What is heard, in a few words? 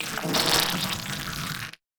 scifi biomechanical movement sound